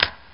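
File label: proximity detonator; Blood: Fresh Supply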